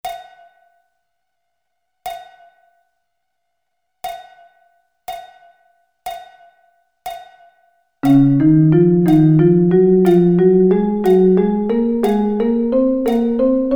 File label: Db Double Sticking